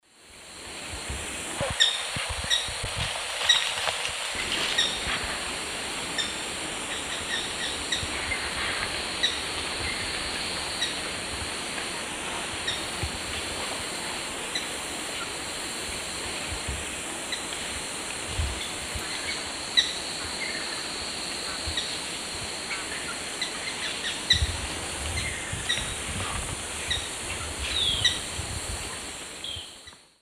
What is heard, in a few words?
Veniliornis affinis, calls; S. America; Eastern Lowlands